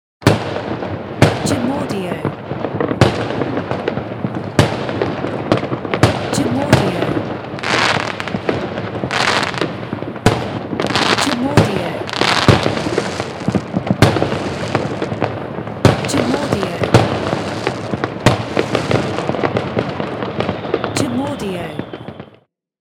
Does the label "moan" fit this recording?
No